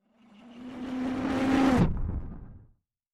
Scifi Whoosh Medium size vehicle noisy engines fast whoosh; Dizzy Duck